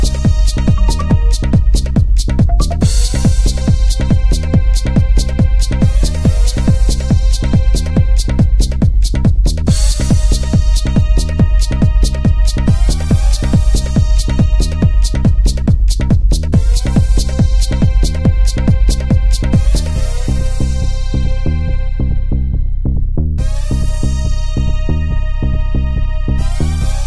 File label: Techno Trance